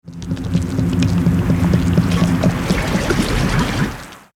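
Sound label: Water Treatment Plant; simcity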